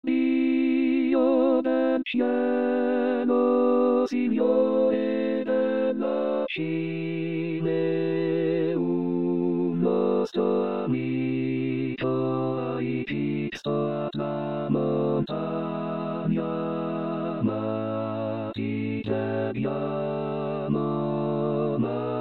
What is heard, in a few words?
tutti, chorale